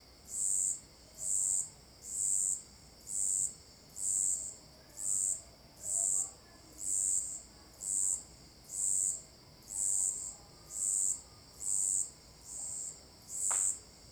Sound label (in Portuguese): Cigarras e grilos marcados e ritmicos; alto paraiso de goias